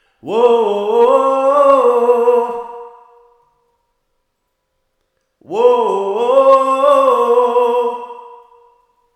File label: reverb